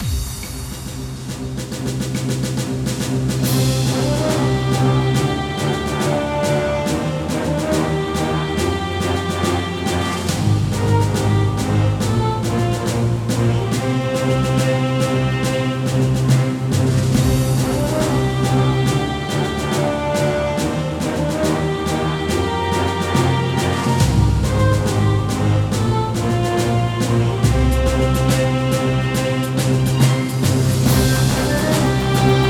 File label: music domination; Castle Storm